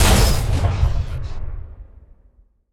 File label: Sci-Fi Weapons-Rocket Launcher